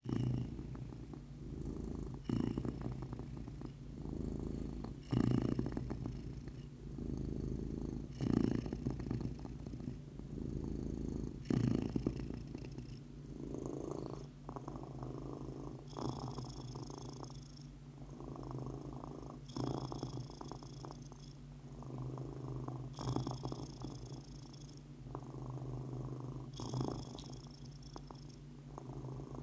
Cat Purr

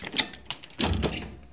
Opening Small Gate

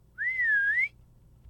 Whistle

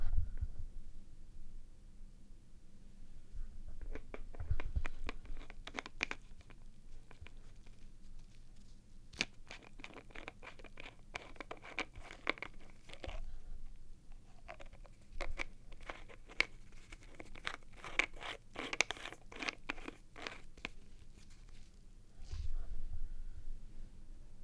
Bottle cap twist

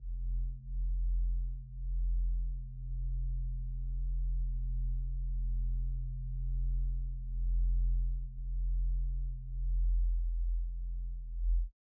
Disturbing Low

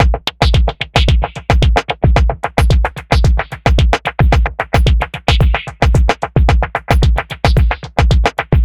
Abstract Electrofunkbreakbeats 111bpm

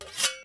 Metal Sharpen

